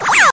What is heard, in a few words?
Laser Sound